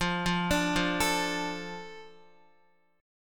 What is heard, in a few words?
F 6th F down Guitar; F6 arpeggiated